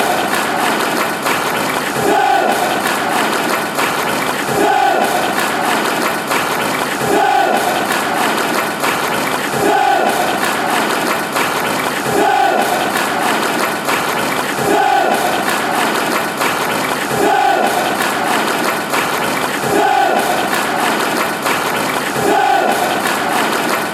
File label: clap, fan chants; bcfc